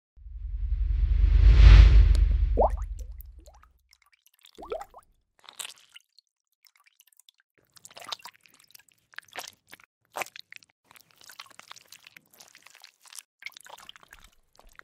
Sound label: Polish corrector; لاک غلط گیر